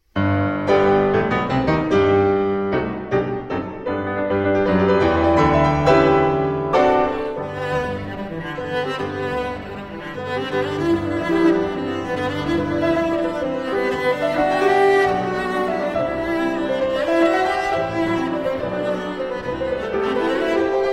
Cello Sonata For Cello and Piano in G Minor, Allegro Mosso